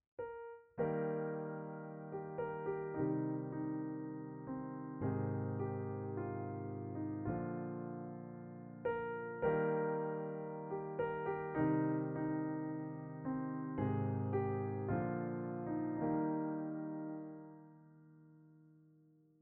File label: Steinway D mellow intimate; HB Steinway D